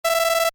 Computer Beep, Short; Multimedia; Stuart Duffield

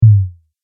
Sub Drop Single, Low Impact Sound Effects

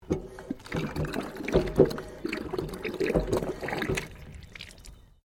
PUMP WATER HAND OPERATED; water pumps; Machinery; Machines

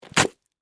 Shovel Stab Mud, Impact; Yard Tool Sound Effects; Yard Tools (Sound Effects:Tools); BlastWave

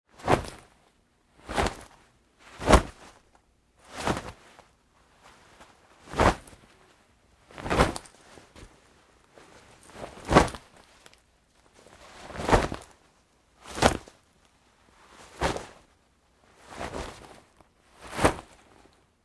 Foley, Fight Moves, Nylon Shorts, Singles, Med; Wrestling And Struggling

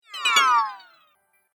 Cartoon Game Spell Magic

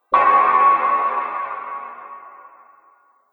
Mangled Metal Impact; Miscellaneous Impact; Andrew Fleming

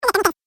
Gremlin Chatter, monster sound effect; Horror Sound Effect

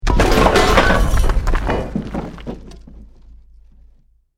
BUILDING COLLAPSE SCAFFOLDING SMALL; Created dramatic effects of buildings collapsing or breaking

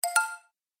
Musical Game Elements, Video Game

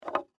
Shot Glass Up, Pick; Foley; BlastWave

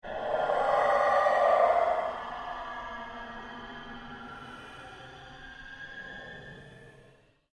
Ambient Pulse Ghost Chatter; Sound effects created by manipulating other sounds; Scary Soundscape; Scary Soundscapes

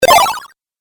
8 Bit Style Extra Life; Video Game Sound Effects

